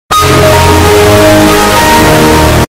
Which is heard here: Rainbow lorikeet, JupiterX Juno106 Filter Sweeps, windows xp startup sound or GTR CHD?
windows xp startup sound